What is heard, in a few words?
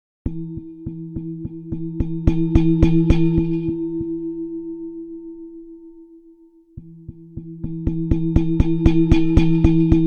bowls